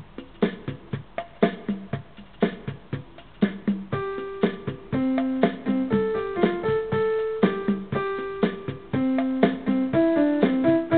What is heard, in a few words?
Pure music